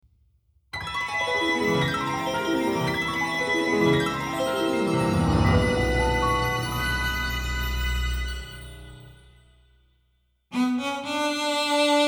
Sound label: Full Moon Cello; the legend of zelda links awakening orchestral arrangement 2015; 1st Instrument of the Sirens